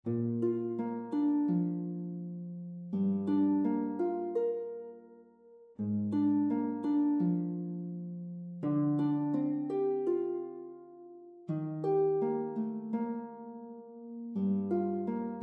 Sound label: lute; SILENT SPRING